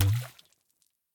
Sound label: reel Stop Boing; strollingstaxx